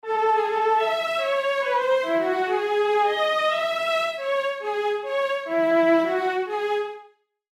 Jazz MIDI Melody, C#m, 160; Corosion

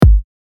KICK; UNISON

